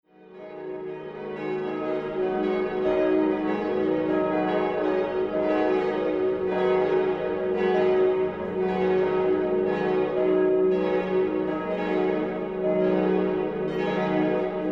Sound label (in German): Glocken Neu Isenburg